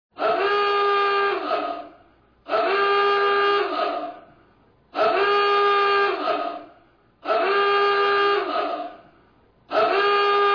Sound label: Submarine Dive alarm